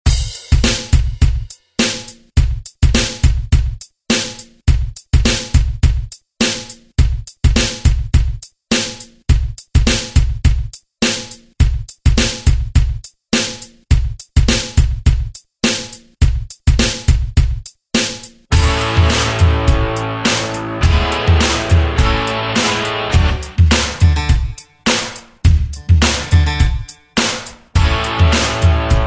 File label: ReDrum; DJ Mhark